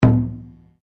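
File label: Drum Taiko forte, Japanese Taiko Drum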